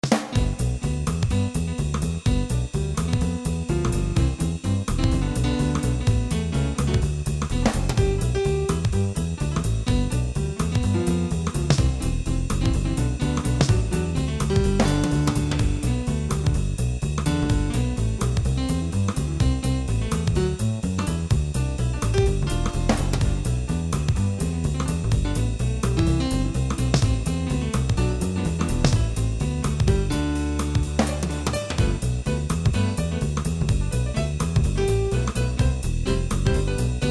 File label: pianist; Jingle Bells